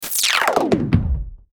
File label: Descend Click Drum Hit; Descending Sound Effects; Blastwave